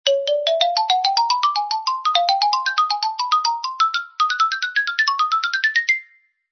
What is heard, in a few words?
XYLOPHONE